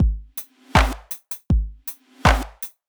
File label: loops; Messing around in LMMS.